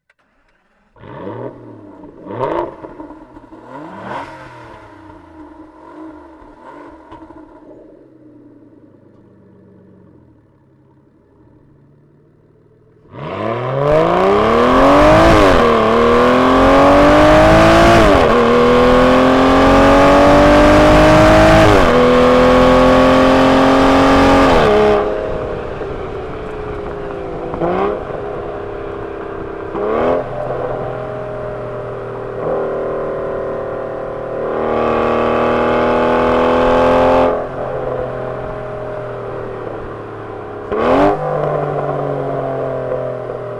Aston Martin Vanquish

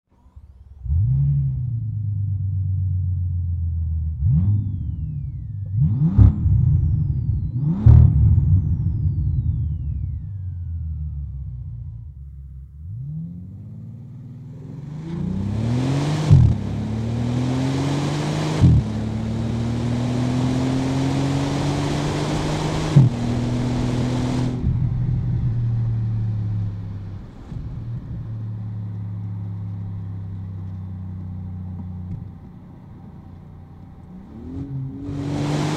Bentley Continental Supersports

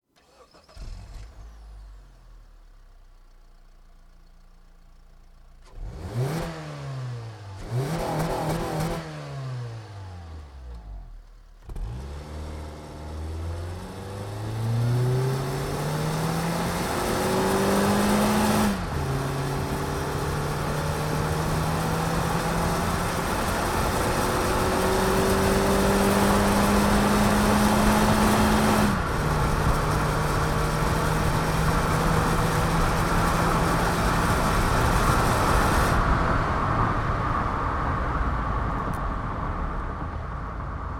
Honda Civic CNG

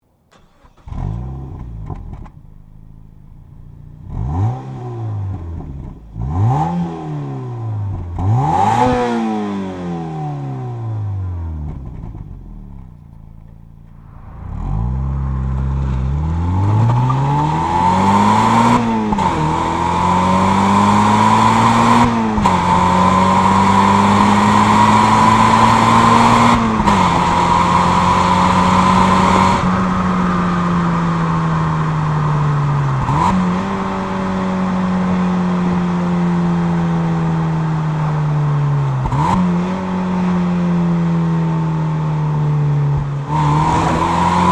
Mazda MX 5 Miata PHRT

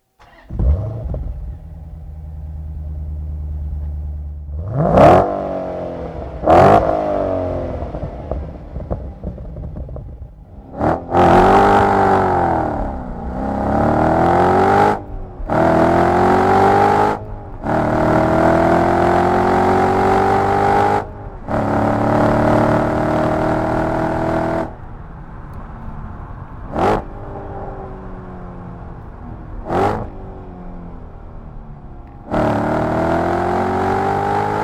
Dodge Challenger SRT8

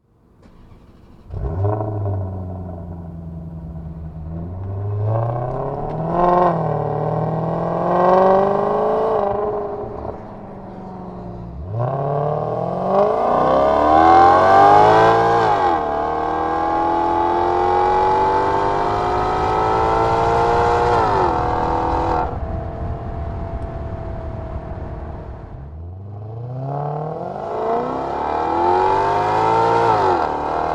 Maserati Quattroporte

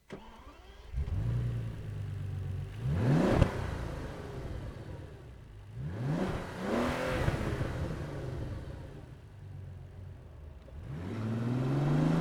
Bentley Continental GT W 12

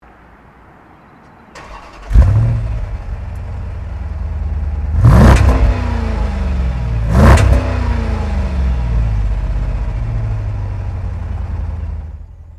Jeep Grand Cherokee SRT8

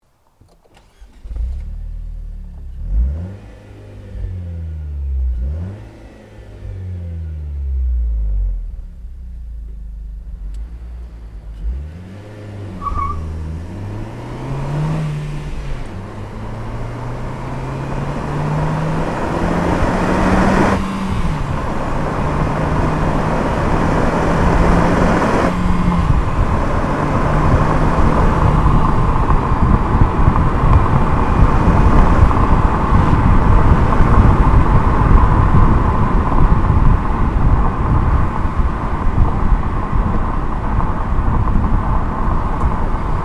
Nissan Juke NISMO

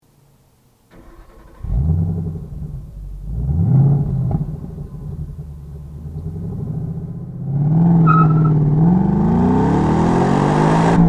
Ford Mustang GT

